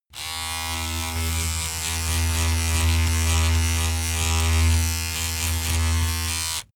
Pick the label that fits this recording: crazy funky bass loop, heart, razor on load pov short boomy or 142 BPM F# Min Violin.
razor on load pov short boomy